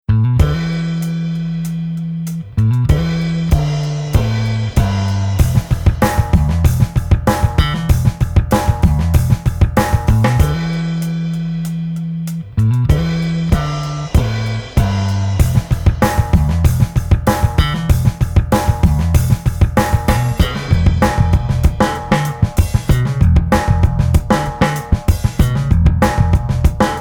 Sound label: drum groove